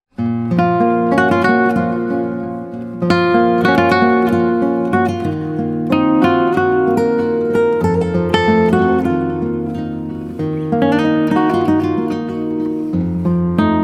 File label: Guitar